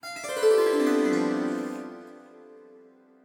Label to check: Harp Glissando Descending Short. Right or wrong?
Right